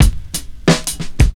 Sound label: DRMS, Underground Hip Hop